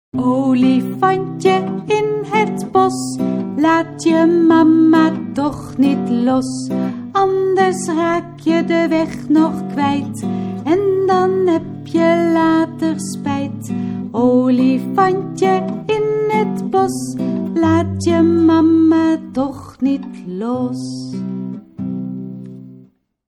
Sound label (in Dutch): olifantje in het bos